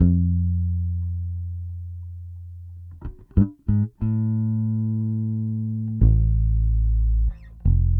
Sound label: Bass, 70s Analog Funk